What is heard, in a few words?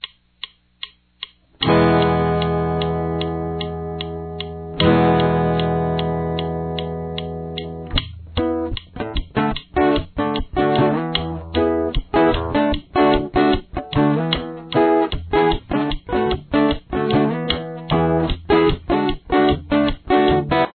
guitar, Here’s what the intro sounds like with both guitar parts; Guitar 2